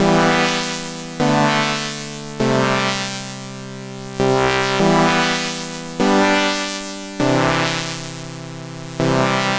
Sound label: C64 Synth